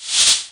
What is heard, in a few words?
bullet L to R slomo, near miss; weapons